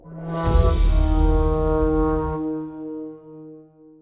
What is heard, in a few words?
User Message Fail; rise of venice windows gamerip 2013